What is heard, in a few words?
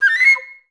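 flutes C6; Best Service; Peter Siedlaczek's Advanced Orchestra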